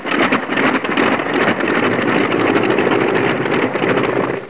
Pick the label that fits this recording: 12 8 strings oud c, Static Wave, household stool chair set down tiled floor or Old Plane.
Old Plane